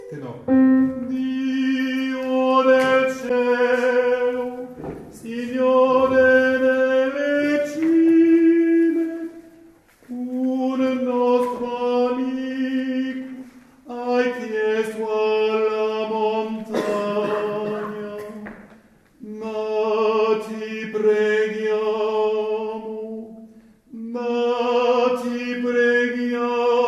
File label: Tenors